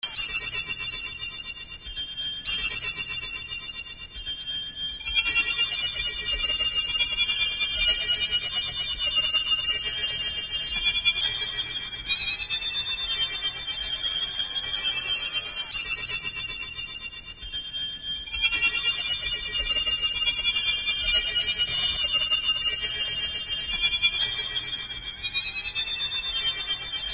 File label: atmo scifi crystal caverns